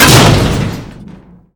Impact Large; Totaled; XBOX

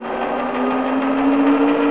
crane arm startup; machines mechanical

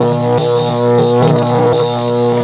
electric; XBOX; AntzExtremeRacing

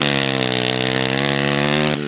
power, Engine

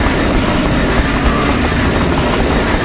grind wire bench; terrains; Tony Hawk's Project 8; XBOX

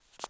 marble stop, footsteps

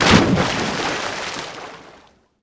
splash; Splashdown; XBOX

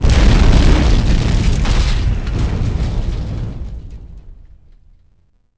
boom; The Elder Scrolls III Morrowind GOTY; XBOX

